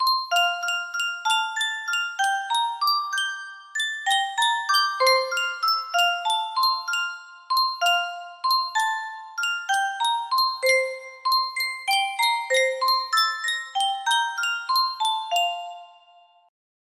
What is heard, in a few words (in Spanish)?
yunsheng caja de musica, music box melody